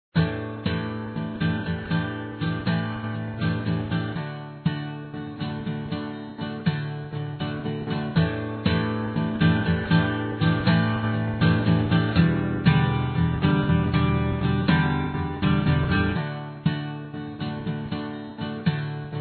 Acoustic Guitar - Violin - Acoustic Sunburst Guitar - Oil Slick Guitar